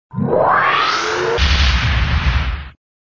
Sound SFX, flipper; sttng

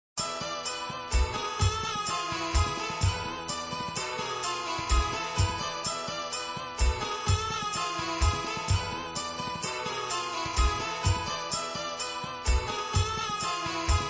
Monkey music looping; Monkey brian music

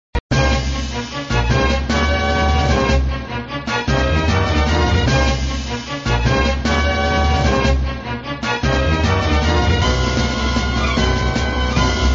Music, flipper; sttng

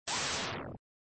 Menusound System Test report swish sound, flipper; Systemsounds

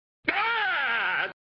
scared Ahhhha; Sallah

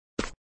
Step; flipper

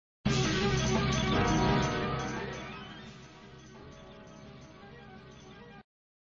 Music background music; Get the Tank background music; flipper

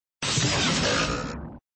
Sound Arrow flying and hitting dowel; flipper

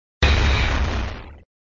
Sound SFX, flipper

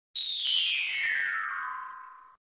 Sound Wish sound down; flipper

